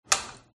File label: switch on electric cooker